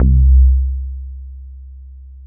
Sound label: DEEEP C2, BASSES; Best Service Alpha Dance vol.2